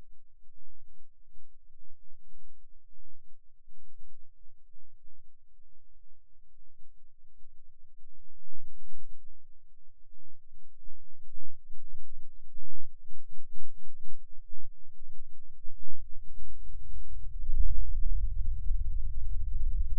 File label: Rhythm Seizure, eeg